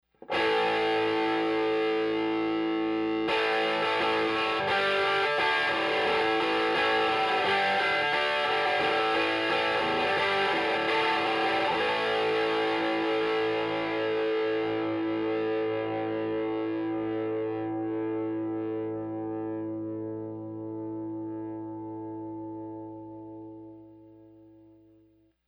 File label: Rhythm